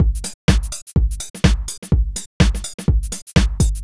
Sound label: DEEPHOUSE BEATS 125